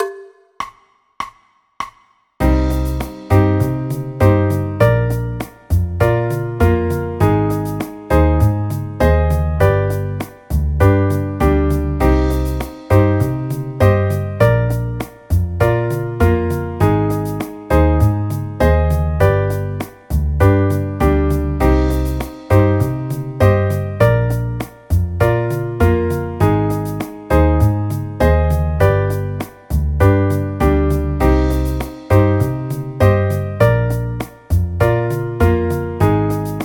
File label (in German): c moll akkordverbindungen